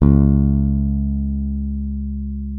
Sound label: C#2, FINGER MUTE; Xsample VOL-1